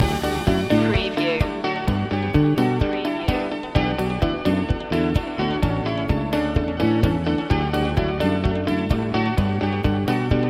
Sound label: corporate ventures loop